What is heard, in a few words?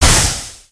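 hit weapon bomb smog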